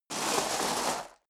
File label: step sneaker gravel medium slide long; schritte